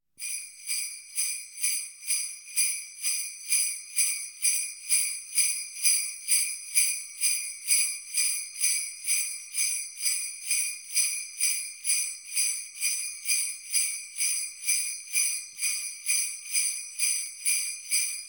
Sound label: Bells Sled Christmas Gently; KMP Film Video Sound Effects